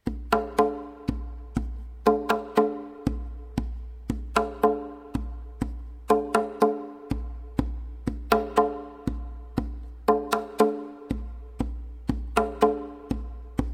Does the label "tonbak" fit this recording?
Yes